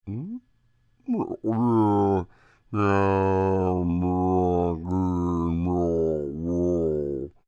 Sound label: Creature, Human, Speak, Groan, Long; Groaning; Voice; Big Room Sound